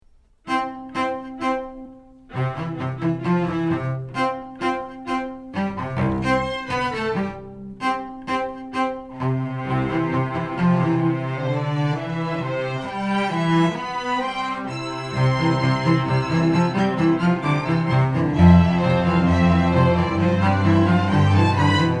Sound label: strings